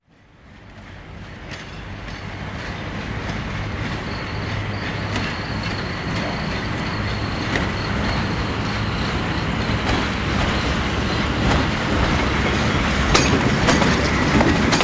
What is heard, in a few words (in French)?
bruit joints de rails; sonotheque